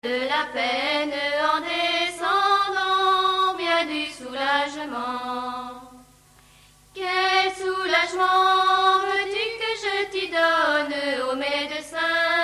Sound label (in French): chansons de l oust